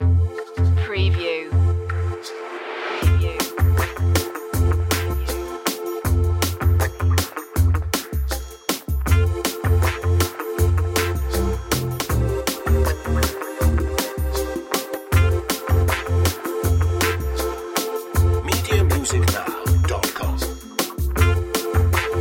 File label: upbeat cool, A stylish and carefree track, with a trendy mix of synth sounds; funky groovy